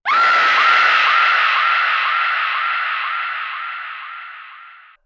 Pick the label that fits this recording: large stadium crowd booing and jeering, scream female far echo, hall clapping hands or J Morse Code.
scream female far echo